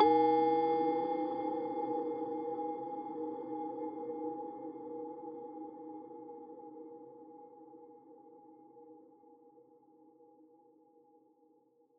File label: Perc